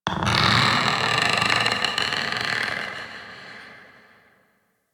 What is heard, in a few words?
Forest Distant Tree Creak; Warframe; Grineer Forest